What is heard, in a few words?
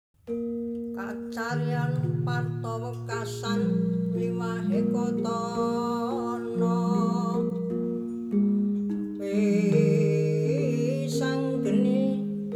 kulit, gamelan; modes and timbres